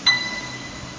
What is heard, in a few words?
Piano